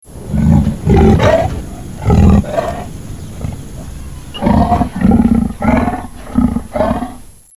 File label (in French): leopard